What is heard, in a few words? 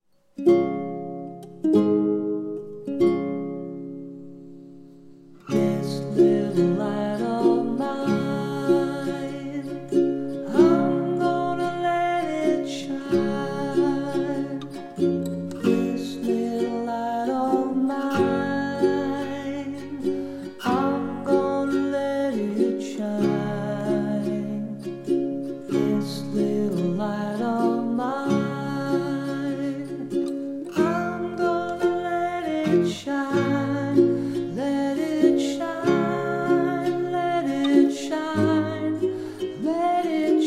bedtime songs